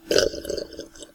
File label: Sip Of A Drink